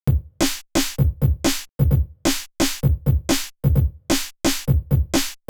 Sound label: beats